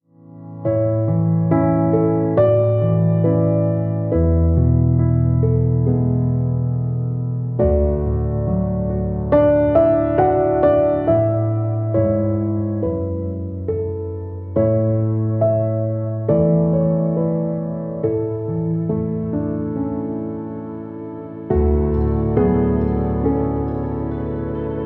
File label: Instrumental